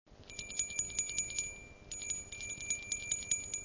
texture clochette

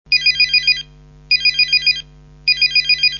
sonnerie

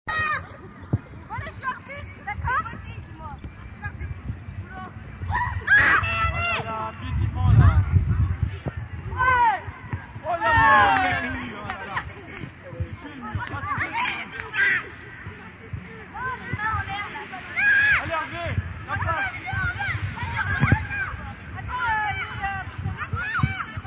ambiance jeux plein air

